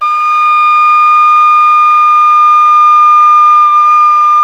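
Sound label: khlui D#5, Thai flute; Spectrasonics; Heart of Asia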